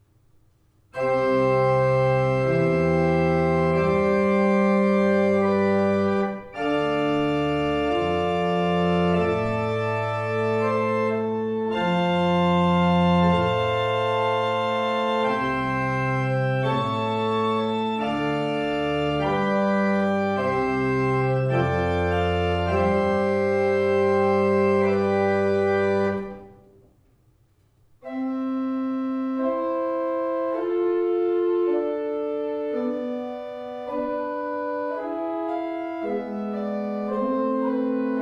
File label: Vorspiel in C, orgels; Wilhelminaoord; Rinck